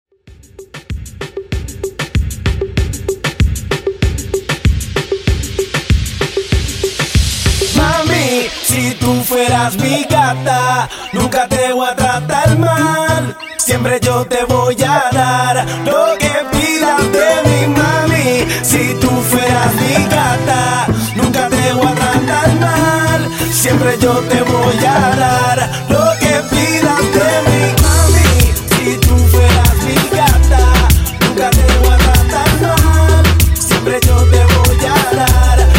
Party Reggaeton 96 Bpm

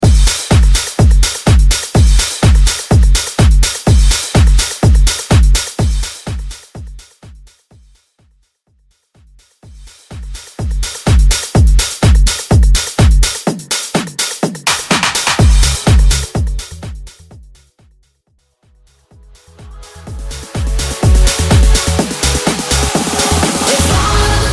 House Edit Club Mix 125 Bpm; Mainstream VIP; Vegas Edit

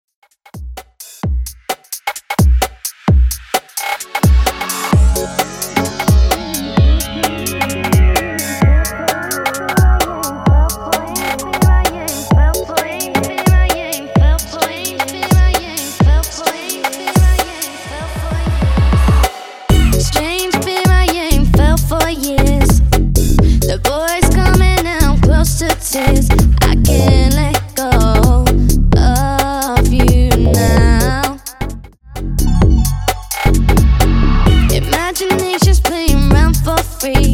Clubbing 130 bpm

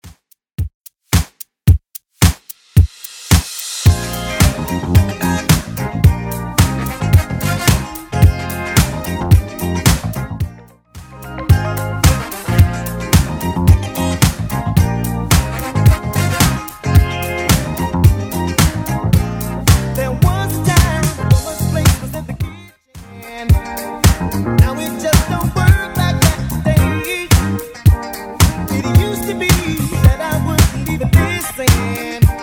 70s Redrum 110bpm CLEAN

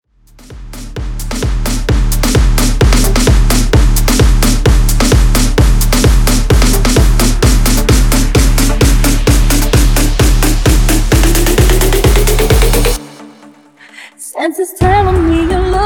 Mash Up EDM

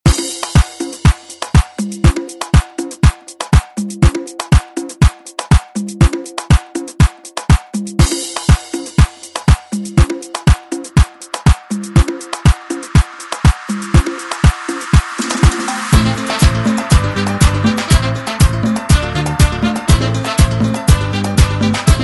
90s Re Drum; DJ Mhark

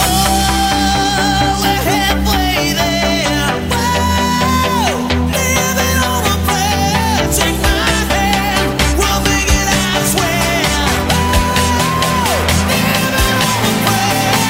130bpm G; MashUp

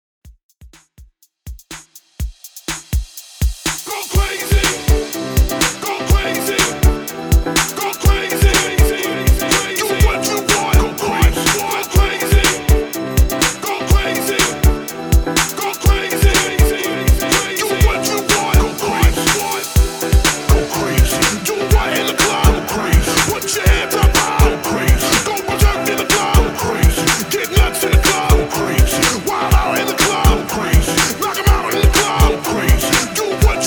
HYPE REDRUM, 123

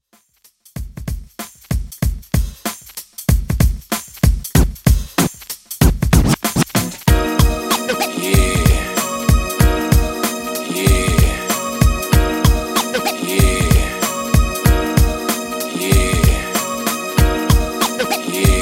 90s R B 95 Bpm

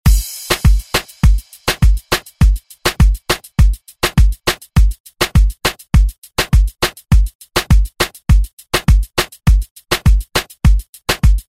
Moombah Re Drum; DJ Mhark

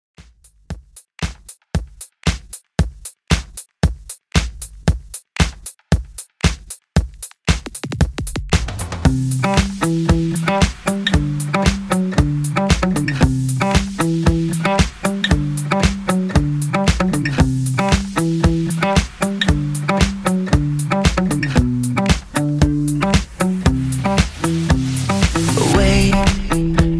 Club Extended Mix 115 Bpm Clean

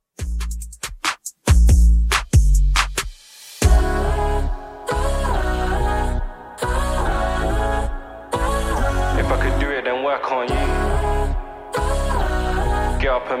Top40 Redrum 140bpm

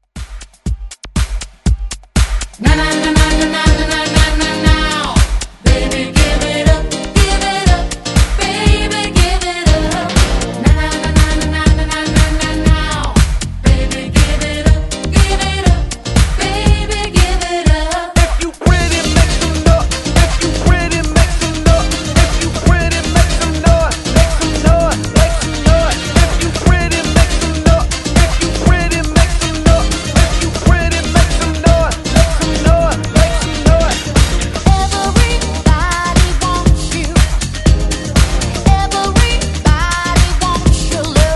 90s Dance Party Hype ReDrum 120 Bpm; DJDOLLS

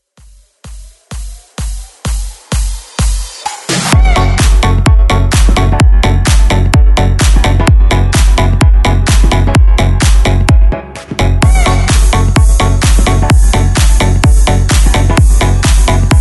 Bounce

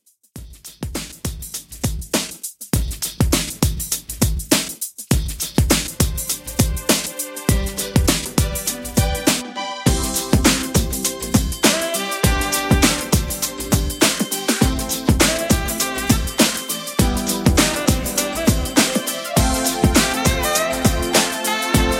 90s Classic Redrum 101Bpm

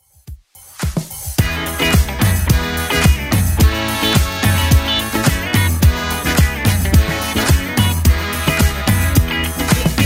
80s Club Edit 108 bpm

